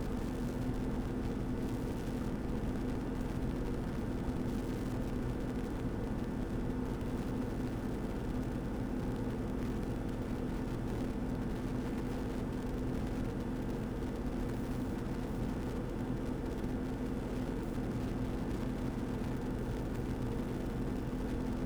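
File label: Refrigerator; Fans and Drones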